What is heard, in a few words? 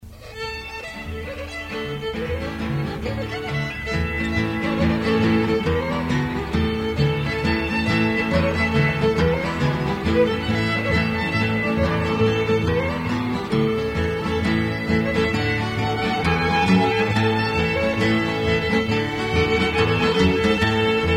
Old English Schottische